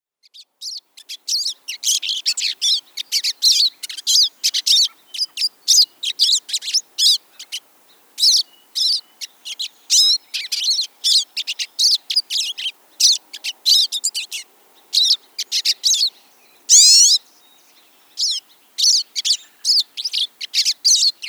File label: Pine Siskin